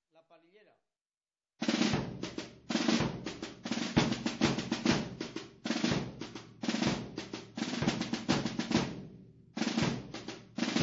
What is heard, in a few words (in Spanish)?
palillera, tambores; Calanda